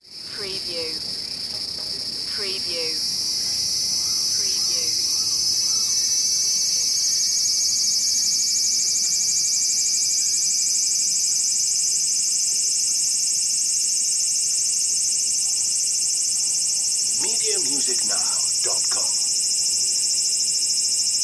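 insect call cicada sci fi sounding long Tokyo Japan cemetery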